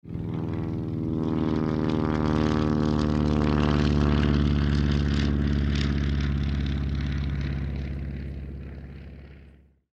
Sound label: airplane propeller passing by